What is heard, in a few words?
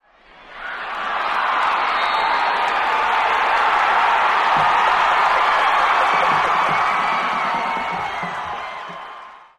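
football crowd swell, cheers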